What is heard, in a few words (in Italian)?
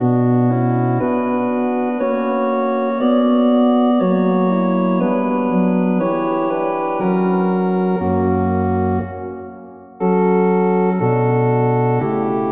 Wer nur den lieben Gott lässt walten, organo; Ricostruzione virtuale